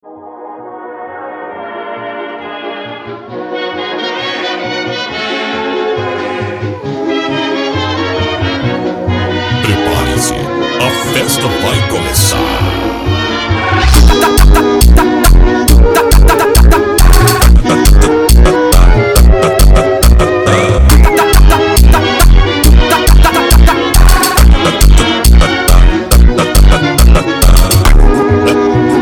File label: burning memory funk